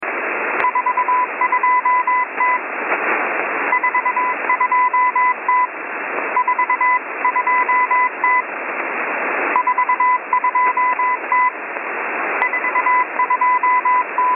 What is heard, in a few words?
4589usb